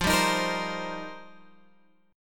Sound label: F 7th Suspended 2nd Suspended 4th, Guitar Open F; F7sus2sus4; strummed; 17,14,15,17,15,x